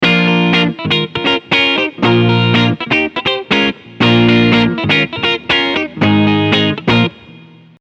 Boss GT-10 Vox

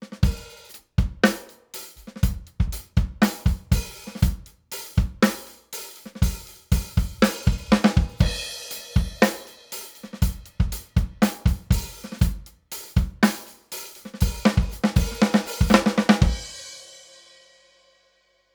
Drums BD SD OH

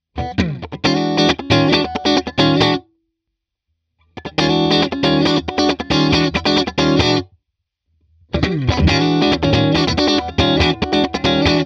Funk; Universal Audio MAX 1176 in LA 2A

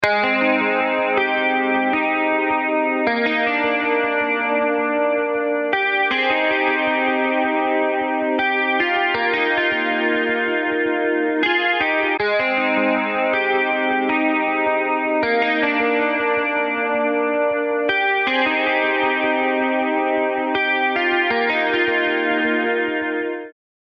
Guitar Wet

